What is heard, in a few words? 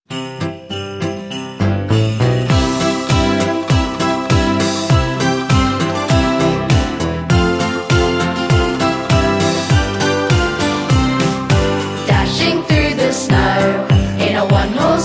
jingle bells audio kids